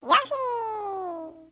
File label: win; npc; yoshi